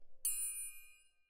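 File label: Percussion, Jazz for piano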